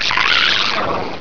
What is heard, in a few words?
die, headcrab